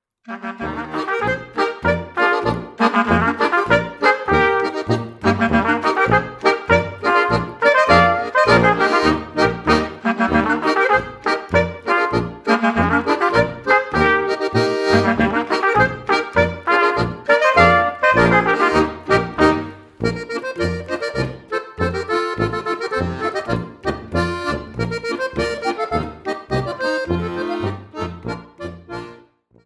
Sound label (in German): Polka